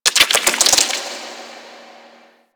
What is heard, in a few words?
Ocean Underwater Plant Chatter; Ambience; Warframe; Grineer Ocean